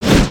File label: Mole Whacker Swing